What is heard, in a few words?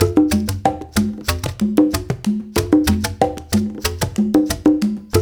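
93 bpm, conga; Spectrasonics - Supreme Beats - World Dance; Patum Conga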